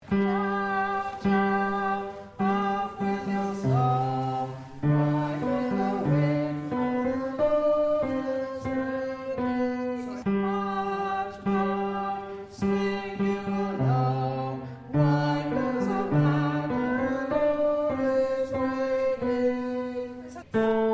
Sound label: Tenor